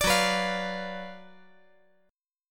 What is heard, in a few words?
Gb Major 7th Suspended 4th Sharp 5th